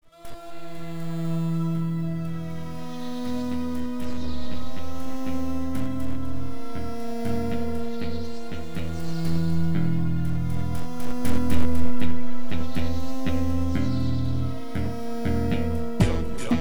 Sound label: Telephone Hip Hop